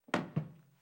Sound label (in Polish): krok powoli